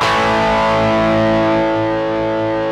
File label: DIST CRD A0, DIST GUIT